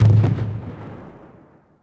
distant explo

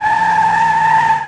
limo peel; lasvegas

